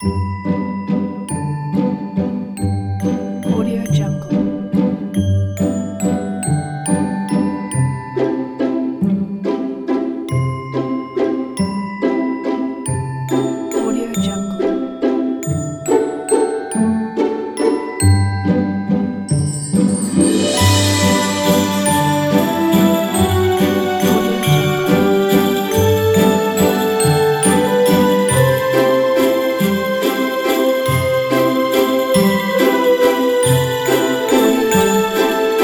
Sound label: christmas story